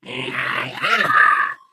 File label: ballbot jump